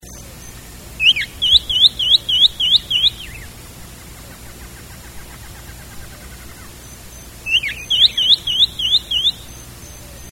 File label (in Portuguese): canto do joao pinto amarelo